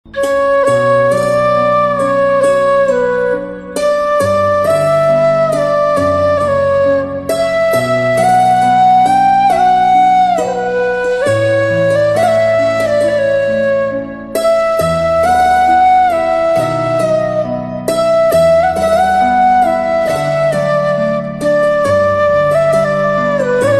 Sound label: Flute Instrumental Ringtone; krishna flute mahabharat